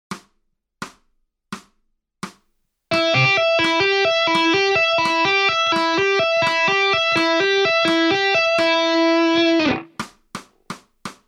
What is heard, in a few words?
Lick